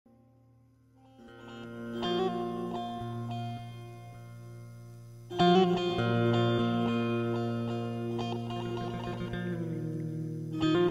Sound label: saz